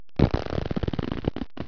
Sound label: zap, debris; svencoop; cracklife